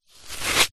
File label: foley stone or small rock lift out from wet sand